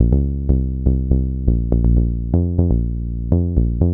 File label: 122 BPM Bass Synth C maj A min Tonic A; Pro Bass Synth Studio Kit; MMB